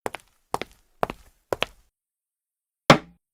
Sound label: walk in